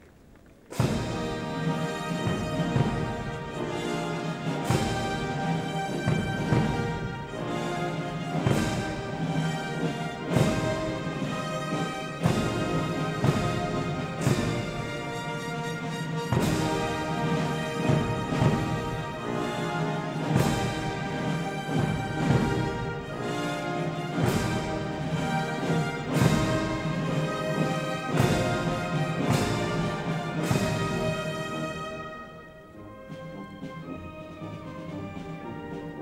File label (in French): Batterie-fanfare; Batterie-fanfare de la Garde R publicaine